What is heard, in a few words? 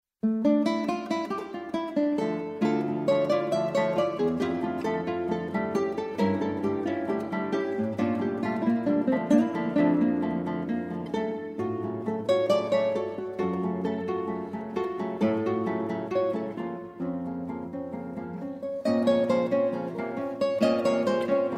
Music for 4 Guitars; Alfred Publishing